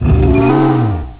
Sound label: boss duck move; Nokia; 5800 XpressMusic; Bounce Touch